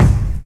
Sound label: bass drum sample c sharp key